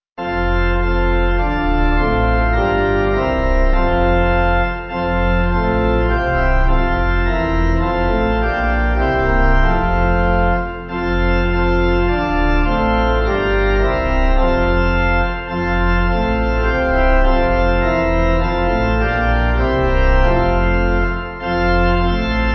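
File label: Pipe Organ, Music Backing Files for Churches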